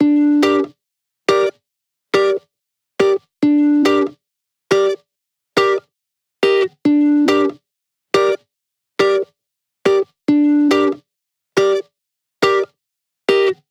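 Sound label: 140BPM G minor Guitar DRY, Offbeat Dub Guitars; Antidote Advent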